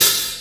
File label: soft sounding open hat one shot a key